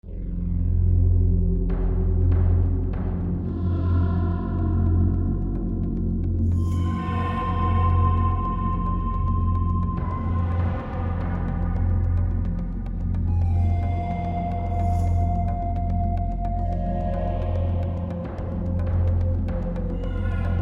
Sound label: horror, music